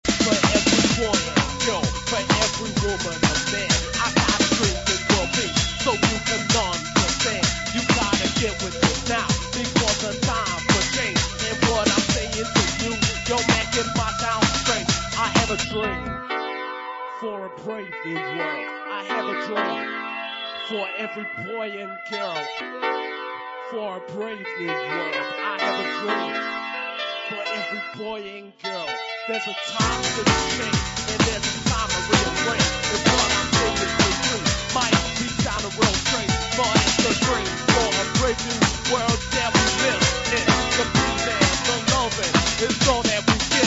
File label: piano